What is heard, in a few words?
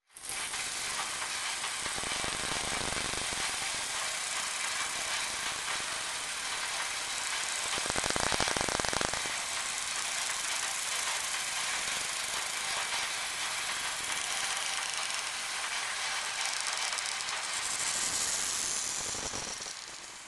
textures and drones high mecha insects swarm; sonniss gdc